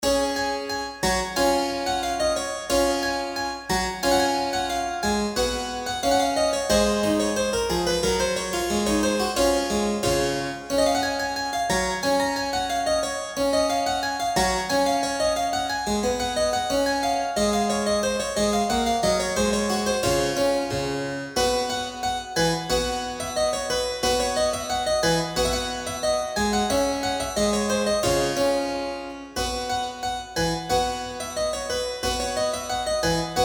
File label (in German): cembalo; dowland puffe